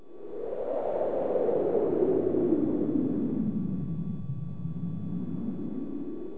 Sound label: Wind; ambience